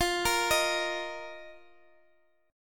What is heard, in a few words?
F 7th Suspended 4th F down